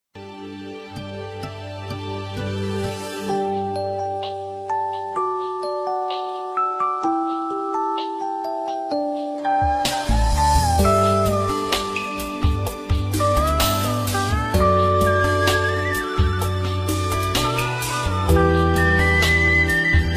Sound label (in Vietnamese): Liên Khúc Tình Ca Quê Hương Đất Nước Nhạc Quê Hương Mới, Nhạc Chuông